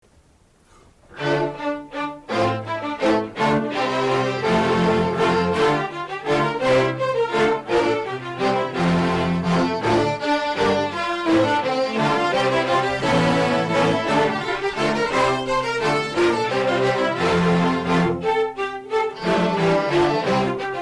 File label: Basse-Danse; Warlock; Capriol